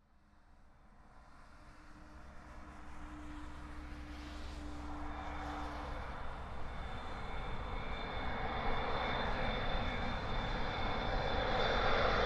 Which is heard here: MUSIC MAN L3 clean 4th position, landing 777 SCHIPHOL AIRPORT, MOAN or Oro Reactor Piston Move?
landing 777 SCHIPHOL AIRPORT